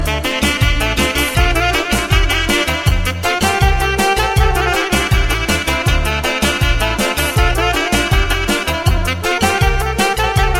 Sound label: cool romanian sax